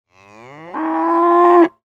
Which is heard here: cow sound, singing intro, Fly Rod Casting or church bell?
cow sound